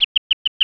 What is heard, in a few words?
Dry Chirp; 11khz; Triazzle 1.0 Demo